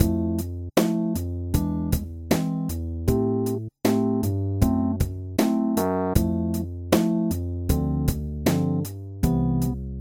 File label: chord